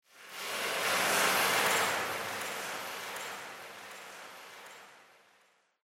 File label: clicking echoes, ambient creatures; Half Life Alyx